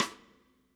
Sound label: Snare Shard; ZeroGravity Drum Shards